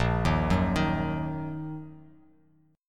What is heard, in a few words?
Bb Suspended 4th Sharp 5th Bb down Bass Standard; arpeggiated